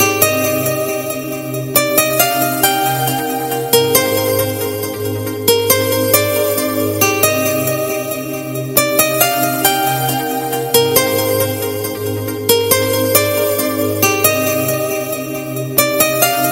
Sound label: soft heart touching musical tone ringtone